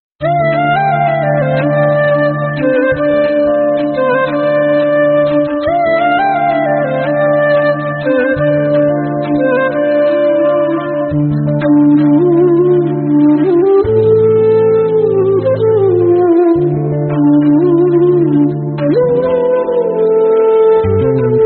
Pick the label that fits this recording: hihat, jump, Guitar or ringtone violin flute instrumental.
ringtone violin flute instrumental